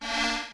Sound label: Window Minimize